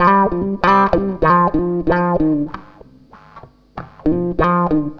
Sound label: WAH; USB Soundscan vol.31; Miro s Grooves; AKAI